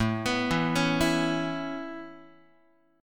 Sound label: A-Major Add 9th Guitar, Aadd9 chord; Aadd9 arpeggiated; JGuitar